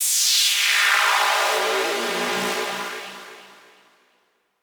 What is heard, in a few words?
Sweep Shuddered